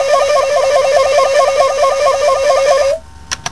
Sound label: koro, shakuhachi